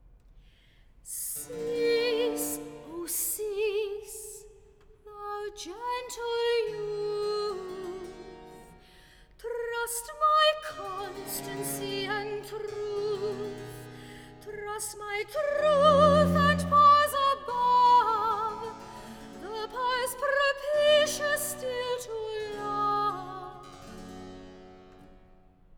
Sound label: Recitative; Cease oh cease thou gentle youth; Handel; Acis & galatea